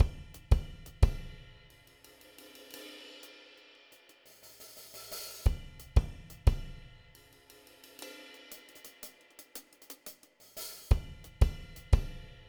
Gretsch round badge rock 176 bpm; toontrack superior drummer 3 test

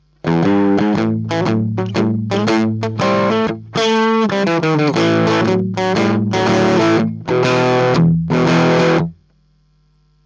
bluesy; rocktile; Gitarreneinsteiger

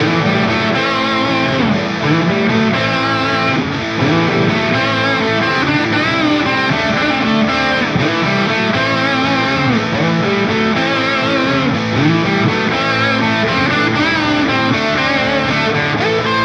CST-24 Rock; harley benton

